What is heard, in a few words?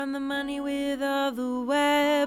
Ld VOX SM7